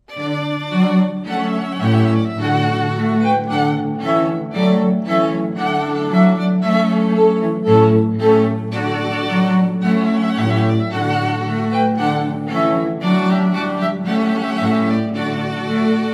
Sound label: String Quartet; Trumpet Voluntary Clarke